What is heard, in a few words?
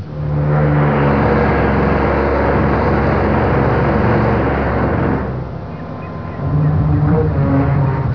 noise